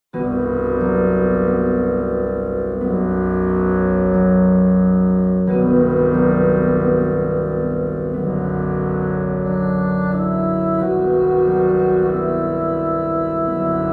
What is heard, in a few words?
bassoon